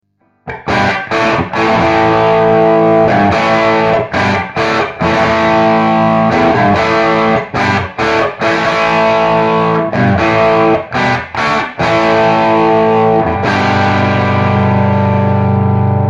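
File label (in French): JMP Les Paul, guitare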